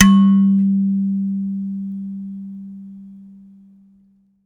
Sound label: Finger, Prepared Kit; Battery 3 Sample Kits